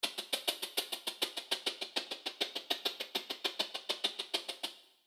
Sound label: Shaker